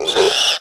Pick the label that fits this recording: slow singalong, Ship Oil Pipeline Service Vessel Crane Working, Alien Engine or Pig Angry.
Pig Angry